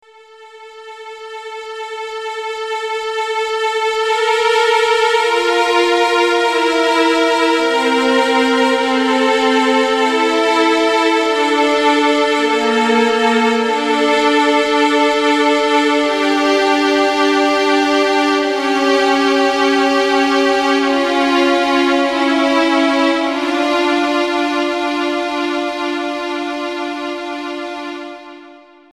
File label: GameOver